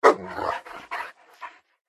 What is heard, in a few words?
dog attack voice